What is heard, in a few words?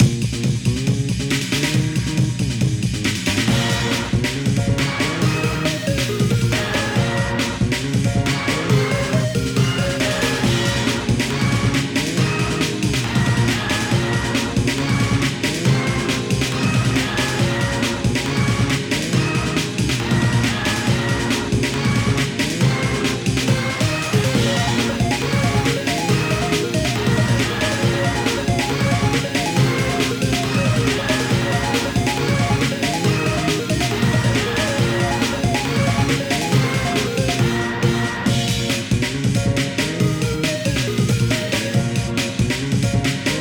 Instrumental; Kick; Friday Night Funkin' Flip Funk OST mod Windows gamerip 2022